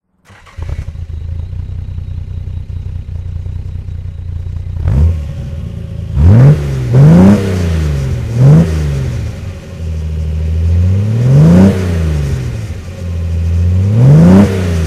austin healey 3000 mk2 throttle

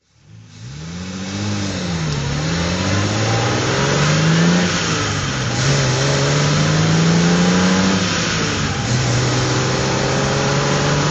healey3000 mkII drive

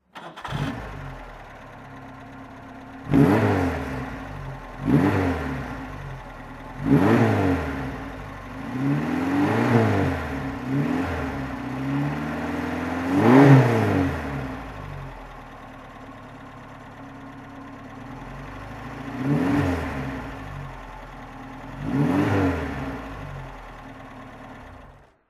glas 1700gt throttle; Glas 1700 GT coupe, 1966; Engine sound driving; V3D/ ClassicarImages

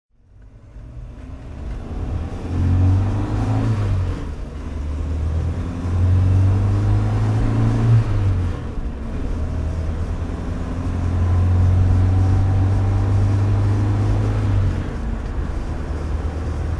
volvo p130 b20a driving

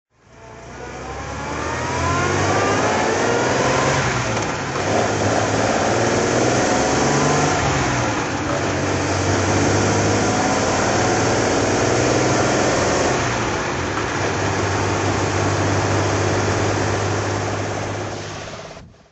healey 3000mk3 weber drive

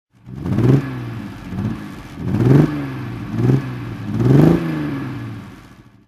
marcos gt 3litre throttle; Marcos GT 3-Litre, 1969; Engine sound; V3D/ ClassicarImages; Marc Vorgers

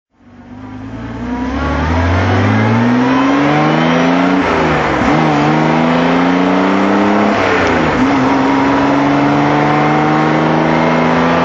austin healey 3000 mk1 sidepipe drive

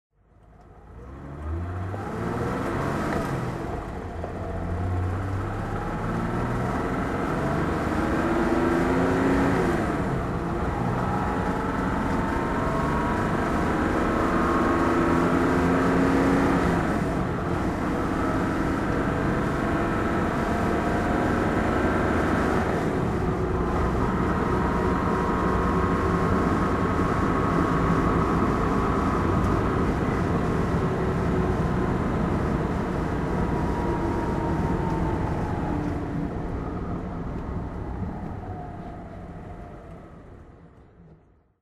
alfa romeo 2600 sprint driving

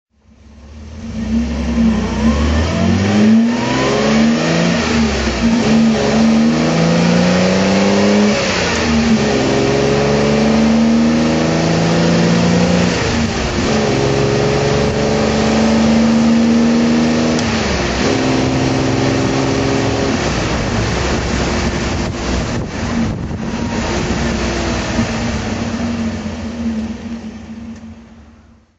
austin healey 3000 mk3 drive

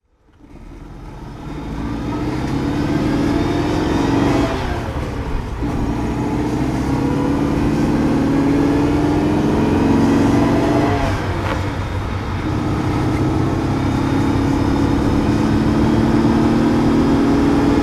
lancia flavia zagato driving; Lancia Flavia Sport Zagato, 1963; Engine sound driving; V3D/ ClassicarImages; Marc Vorgers

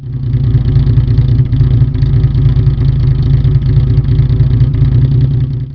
hornet

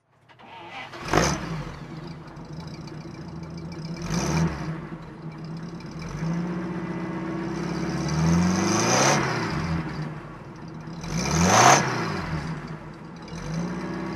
oldsmobile eight throttle; Oldsmobile 98 De Luxe, 1941; Engine sound; V3D/ ClassicarImages; Marc Vorgers

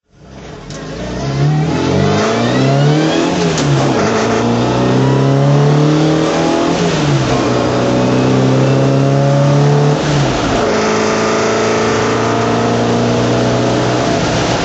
austin healey sprite mk2 drive

